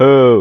hoo kick; SM64 CO-OP; Bob's Burgers

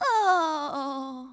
dying; pauline; SM64 CO-OP

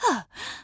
panting; pauline; SM64 CO-OP

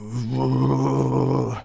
panting cold; foreman spike; SM64 CO-OP

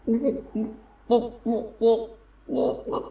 drowning; rosalina; SM64 CO-OP

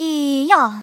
Eeuh; Teto; SM64 CO-OP; Triple Baka Pack

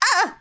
"uh"; birdo; SM64 CO-OP

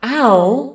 ooof; rosalina; SM64 CO-OP

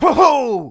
hoohoo; foreman spike; SM64 CO-OP

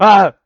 aah; SM64 CO-OP; Bob's Burgers

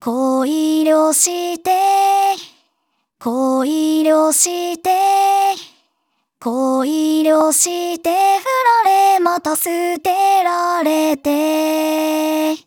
Sleep; Teto; SM64 CO-OP; Triple Baka Pack

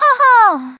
Haha; Teto; SM64 CO-OP; Triple Baka Pack

